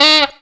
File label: sax; Zero-G; Funky Elements